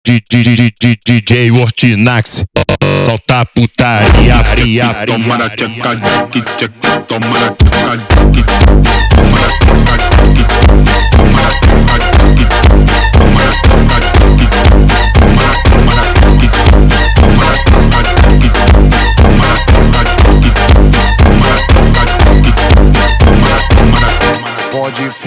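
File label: slowed, phonk music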